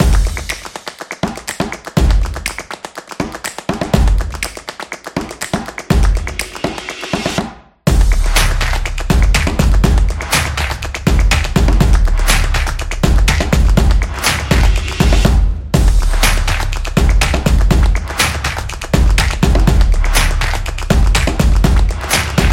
Drums Loop; high energy

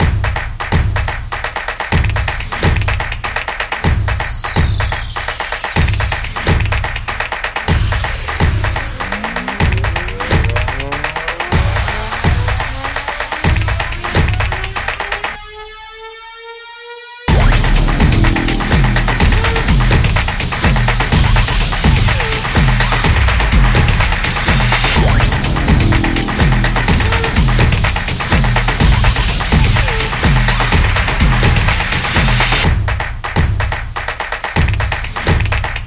Abstract Beat

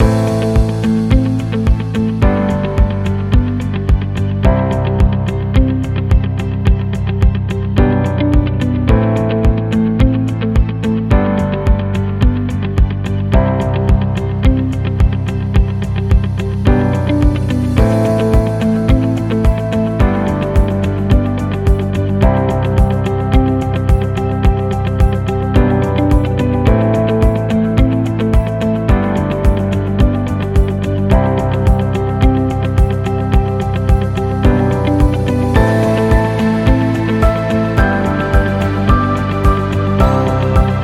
Education Loop

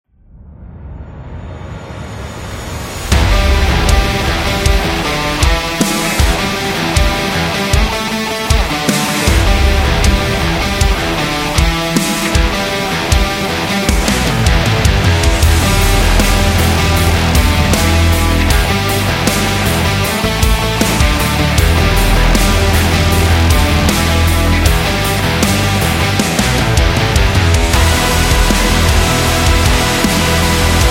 Rock Teaser

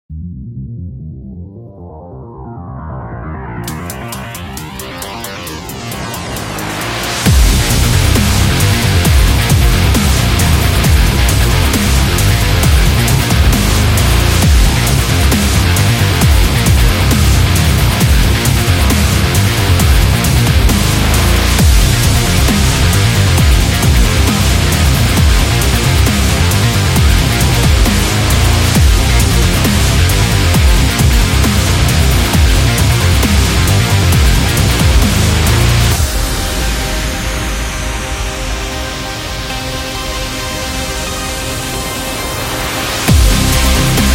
Sport Dubstep Rock

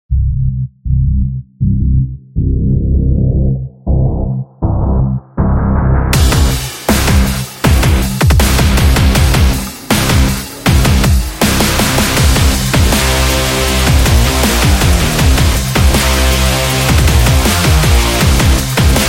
Dubstep Sport

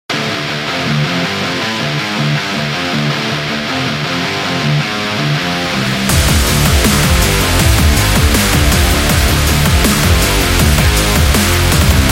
Fire Up, metal

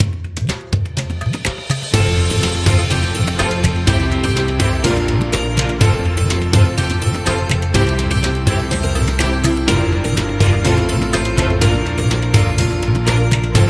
Indian Festive

